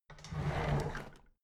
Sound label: drawer big clatter open heavy